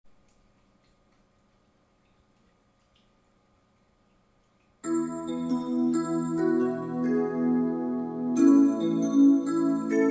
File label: digitar